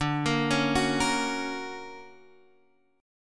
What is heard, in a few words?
F sharp Major D